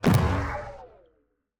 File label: death; toxifin